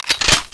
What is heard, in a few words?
air tank